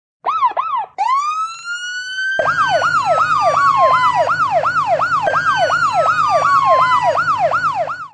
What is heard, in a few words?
Siren mix